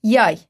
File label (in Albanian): yay